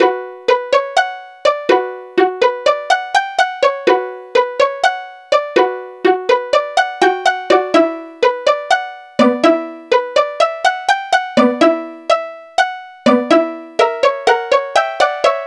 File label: Pizzicato Strings; Sonic Foundry (Sony Creative Software); Club Joints and Street Anthems; Game Time